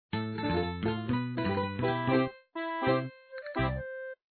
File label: start of day; papa s freezeria gamerip